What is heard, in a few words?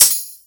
tambourine hit